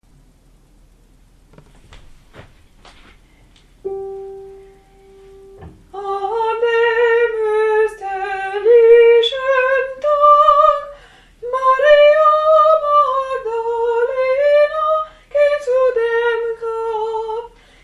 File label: magdalena soprani